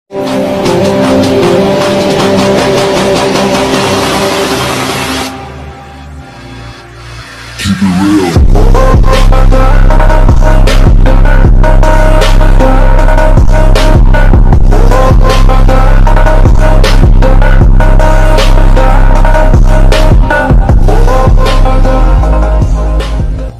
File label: sad ringtone; joker ringtone; showtool music